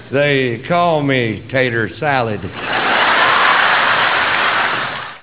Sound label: entrance